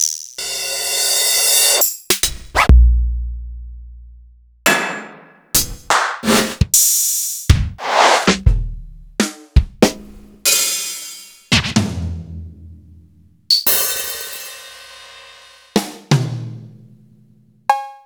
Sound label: Roland XV 5080; m8